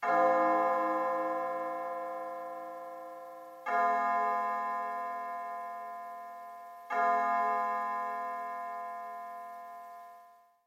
Bell

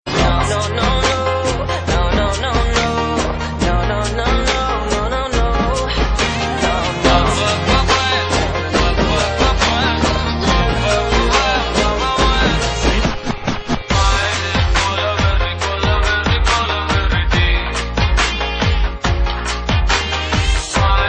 Tamlish

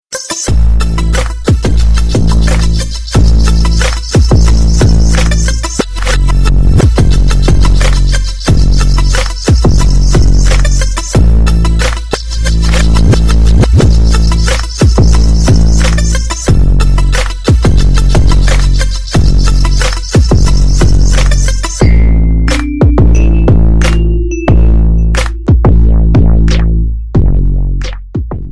Mega Power Bass

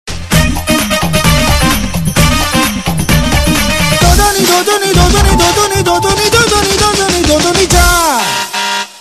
Ring

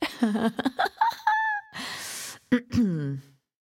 Laugh

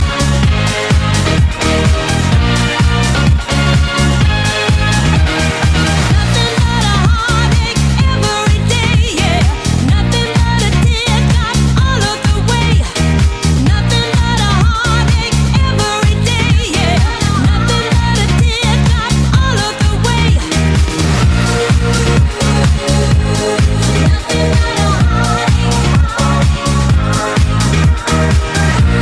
House Music

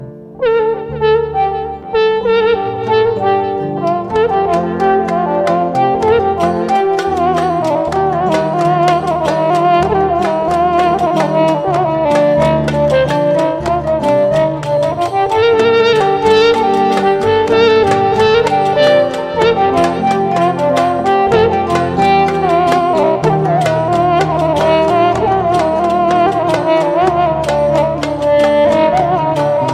Electric Violin

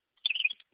Bird Tweet Alert

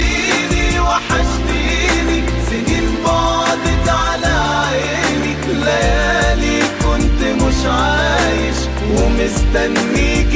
Arabic MIX

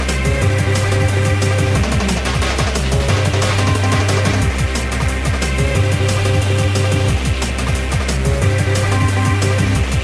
Massive Beats

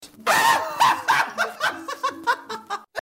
Scream; Trixie Mattel

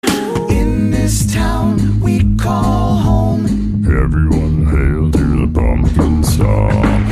Halloween

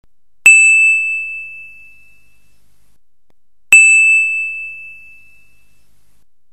Steel SMS double

